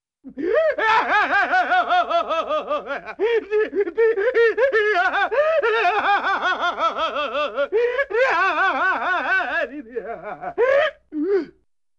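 Funny Man Laughs with a Sobbing-like Quality, Cartoon; Hollywood Edge; Cartoon Trax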